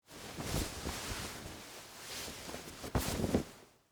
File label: Wool Coat Take Off, Clothes; Foley; Dressing; Human